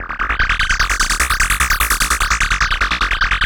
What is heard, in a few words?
Analog Rhythm